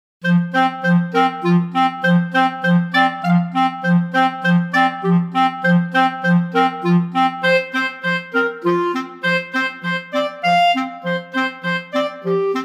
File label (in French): duo pour clarinettes; vignon denys